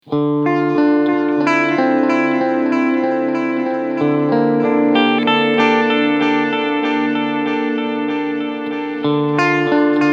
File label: 80s Delay